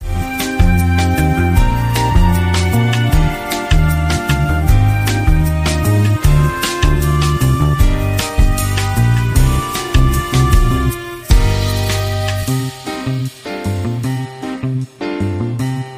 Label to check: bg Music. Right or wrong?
Right